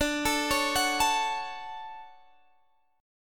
F sharp Minor D; F#m/D arpeggiated; chord shape

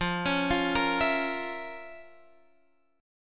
F Major 7th Suspended 4th Sharp 5th F

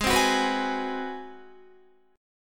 Ab 7th Sharp 9th Guitar, chord; Ab7#9 strummed; JGuitar

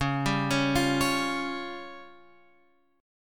C sharp Major Flat 5th

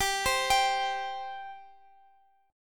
C 5th, G

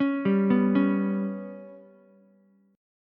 G Major Flat 5th Ukulele, chord; GMb5 arpeggiated; JGuitar

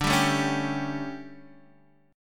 D Major 7th Suspended 4th, Guitar, Half Step Down; DM7sus4

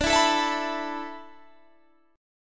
A Suspended 2nd D; Asus2/D; strummed

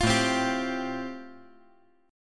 A-Minor 7th Sharp 5th, chord

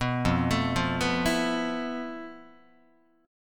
F Major 7th Flat 5th, Guitar; arpeggiated; JGuitar

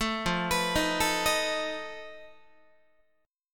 F 7th Flat 5th F down Guitar; F7b5; arpeggiated

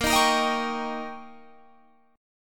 D sharp 7th A sharp, guitar

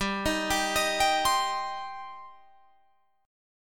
G Suspended 4th Sharp 5th G, down, Guitar, Drop D

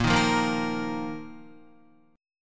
Bb Suspended 4th, Guitar; Bbsus4 strummed; 6,6,3,3,3,5; JGuitar

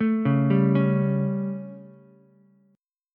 G Suspended 2nd Flat 5th G Ukulele; Gsus2b5 arpeggiated; JGuitar

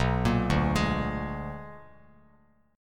B-Minor Major 13th, Bass Standard; BmM13; arpeggiated; JGuitar

